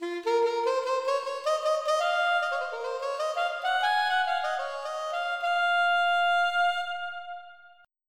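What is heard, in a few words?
soprano sax; Roland - An Invitation To The Roland World Of Music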